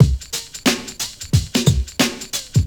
90 DRUMS

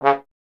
Trombone C#; Miroslav Vitous - Solo Instruments VOL-1

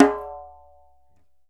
DJEMBE, African Drum; E-MU Producer Series Vol. 7 – Old World Instruments

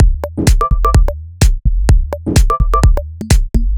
B 127bpm, House Drumloops; Zero-G House Fabrik Drumloops; ZG Drumloops

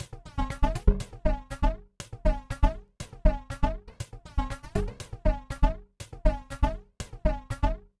Glitched, Processed Percussion

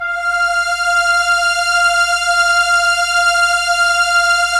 STR ARP, ARP Strings, ARP Slow Ens; Roland LCDP09 Keys of the 60s and 70s 1

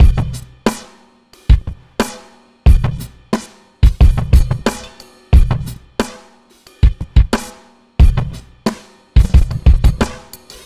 a mixed bag 090bpm, Downbeat Dance Grooves; Zero-G

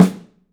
PICOLO; 3D Drums

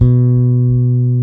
soul bass, deep bass; Giga Samples Collection

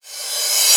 Reverse Cymbal

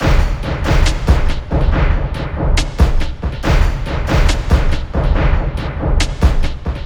SloMo Beats, Loops 140 BPM

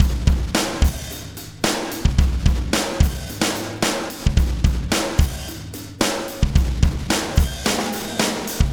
Beat, Processed Drumkits

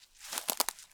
Crunchy Step, outdoor, Footsteps

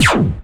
PERC FX

